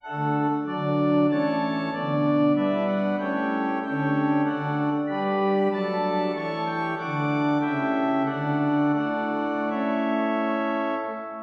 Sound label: Organ